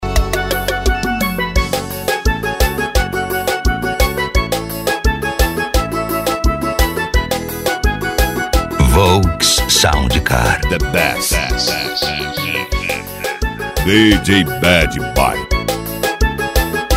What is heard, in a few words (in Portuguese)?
sertanejo e pisadinha; cd volks sound car